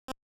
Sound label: blup